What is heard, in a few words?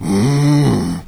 zombie sight; doom3